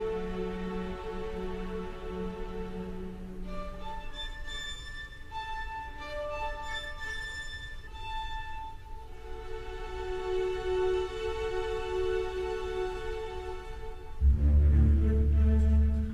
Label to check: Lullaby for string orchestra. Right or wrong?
Right